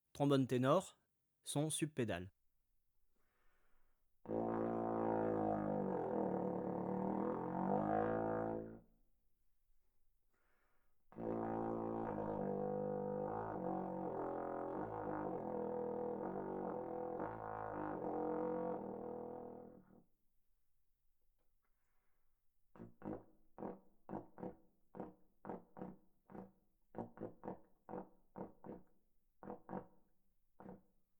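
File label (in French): ténor sons sub-pédales, trombone tenor trombone basse; Textures; Sons granules faibles; traite orchestration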